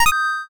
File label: save